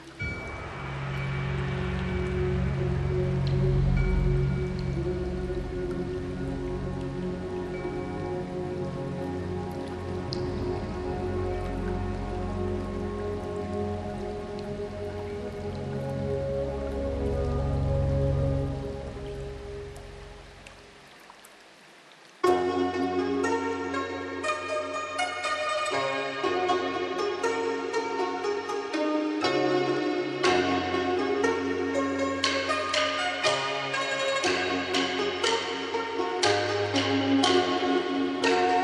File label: Chinese Meditation